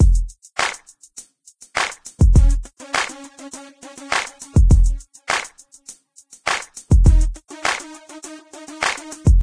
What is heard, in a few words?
Walkman Loop